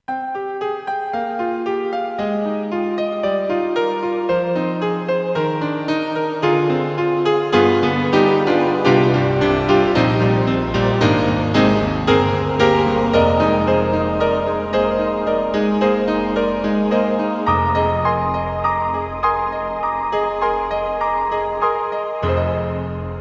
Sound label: Roland SRX-06 Piano Choir, Vocal Collection, XV-5050